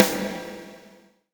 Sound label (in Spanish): CAJA, percussion reverb; MARKYDRUMS